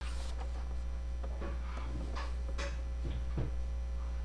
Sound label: knife